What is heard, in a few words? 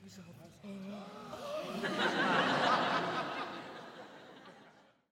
crowd Reaction theater small Reveal; spellbound